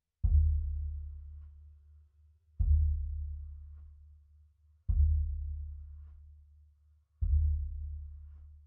Sub

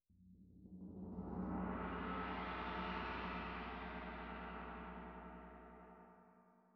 Tam-Tam; Tam-Tam Rear

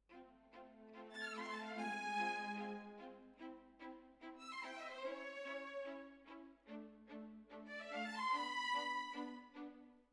Violins Over

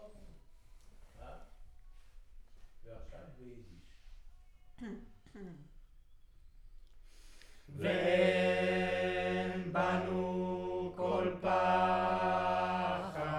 Center u47, Choir

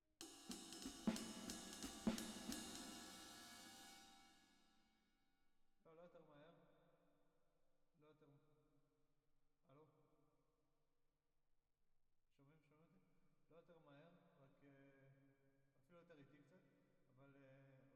Drums Verb

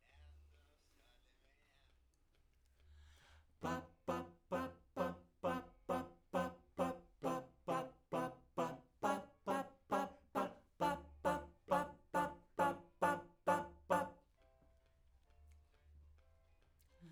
Backs SCHOEPS

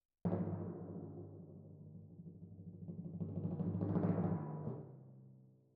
Timp Close

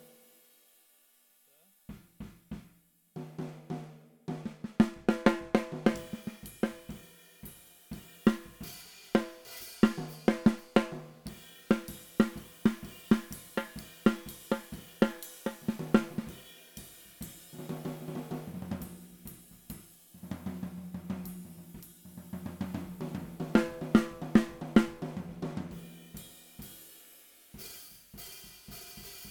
SNR

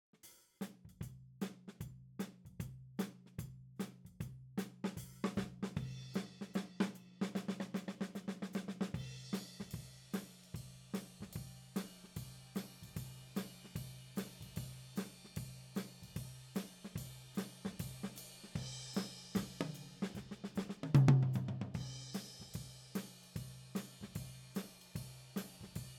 Tom

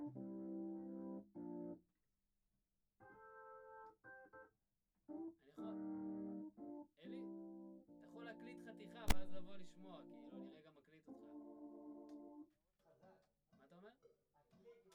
VOC GUIDE

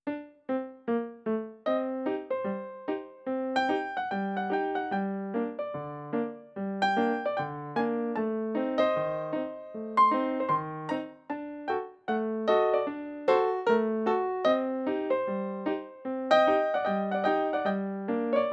piano, Ragtime

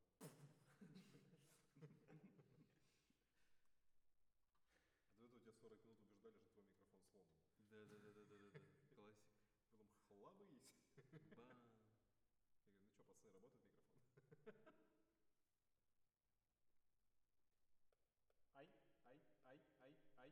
ORTF SCHOEPS, piano

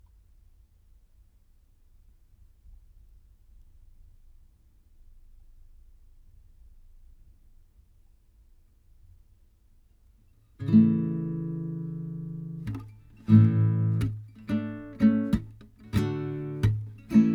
Tape GTR

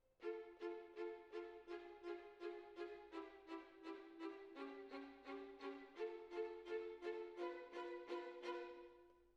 Cello Solo

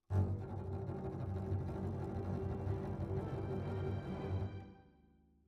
Cbasses

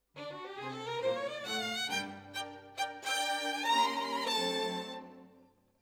Violin Solo

